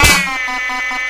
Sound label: sentry damage, weapons; tf